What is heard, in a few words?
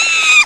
ARRRG; Heroine Iysayana Trilogy